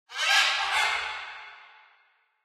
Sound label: macaw; Source Engine; tf; lair